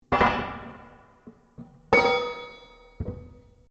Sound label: METAL ON METAL LARGE IMPACT; Metal (Sound Effects:Materials)